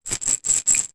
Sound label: med bottle